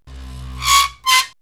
Squeky; X-Static Goldmine

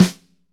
SNR, Snares, Roland

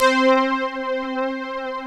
ORCH SYNT; East West - Global Sounds; SYNTHEX

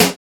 HI-TECH SD; DR.ACCESSOR

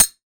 Mug, household rhythm samples Hits

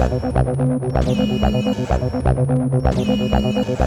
ACID JAM

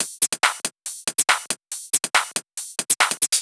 drum Loop 140BPM Perc

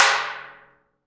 Af. Hand Drm, Ambient

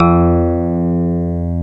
DX1 FM A#3, FM PIANOS

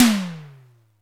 TOM, Simmons SD-8 Drums

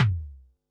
Bassdrum, Pearl SC-40

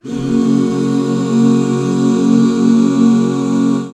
HAL GB, BACK CHORDS, Roland; Sound & Vision - Gigapack II CD 1

